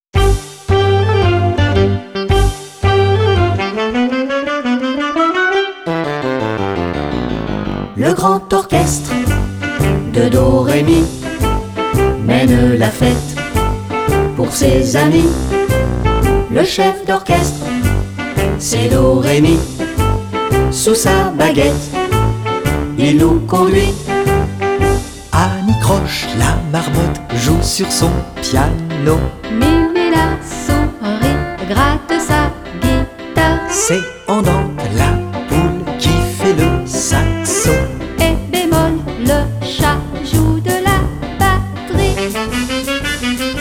Le grand orchestre

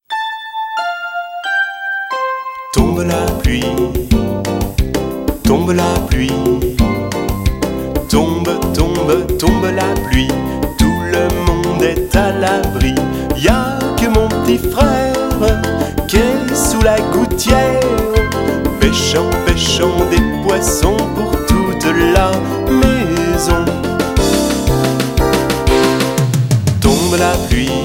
Tombe la pluie

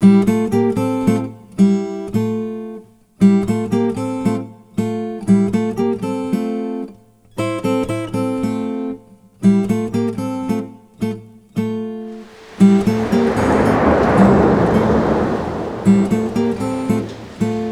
Instrumental

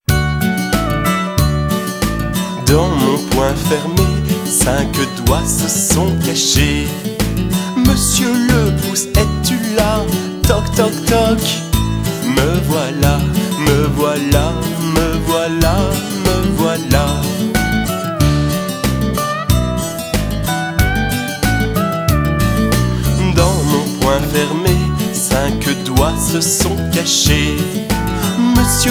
Toc toc toc

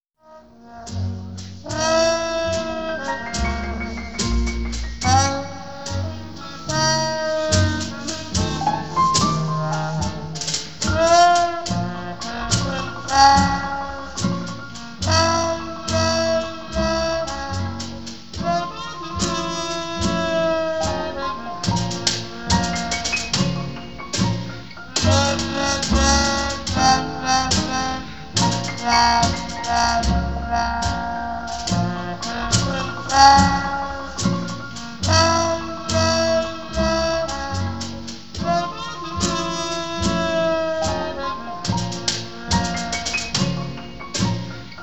Blues (Instrumental); Disney

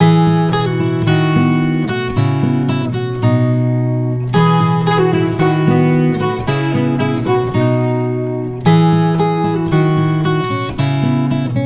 Instrumental

